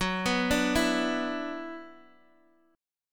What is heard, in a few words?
F sharp 7th Suspended 4th Sharp 5th, Guitar, Open G; x,x,4,4,3,2